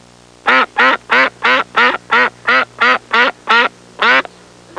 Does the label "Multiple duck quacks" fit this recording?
Yes